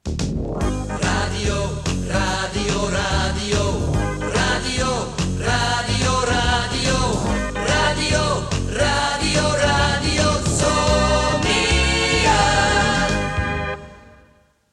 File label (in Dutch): Vingerknip; Radio Zoniën; jingles